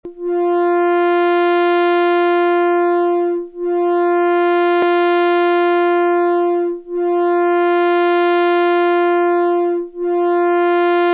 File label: LFO Rate; PWM Malevolent